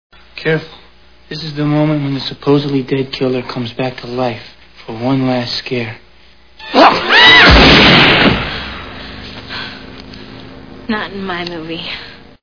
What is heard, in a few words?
scream